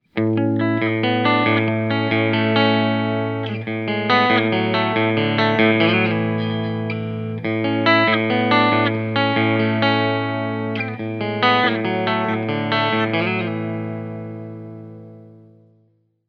clean split, humbuckers; foundry; pickups

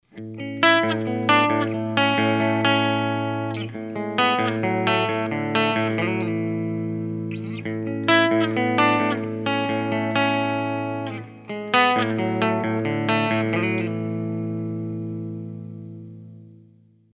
clean split, humbuckers; pickups

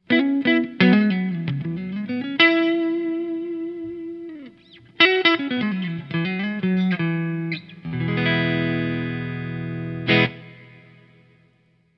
dirtroad neck, strats; pickups

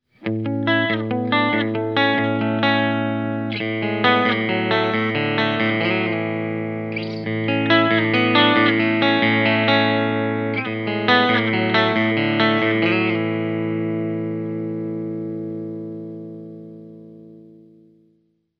clean split, humbuckers; flashover; pickups

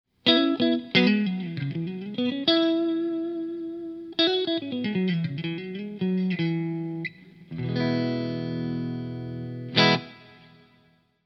middle neck, strats, pickups

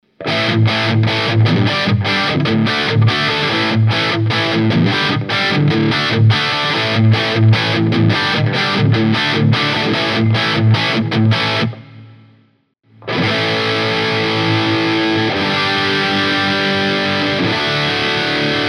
jftp dirty, humbuckers; pickups; phillipspickups

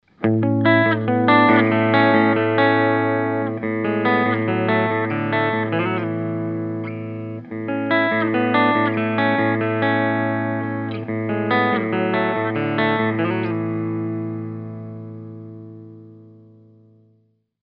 clean, humbuckers; pickups

